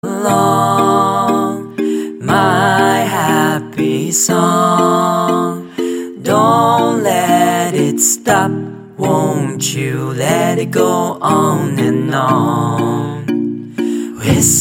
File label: singaporean a happy tune ringtone